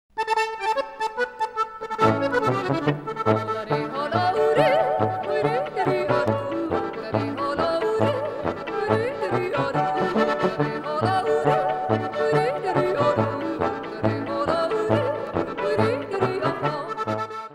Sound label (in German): Scheibenjodler